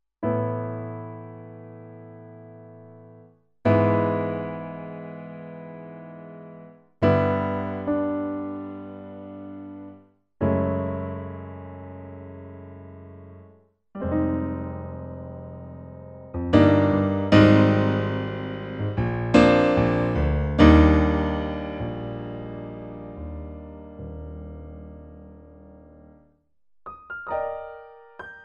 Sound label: Roland RP102 Bright Piano